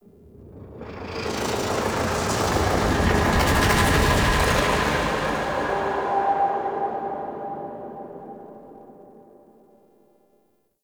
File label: Pulsating Whoosh; Warframe; Ambience; Entrati